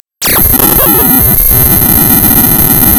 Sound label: Sound of tearing paper, center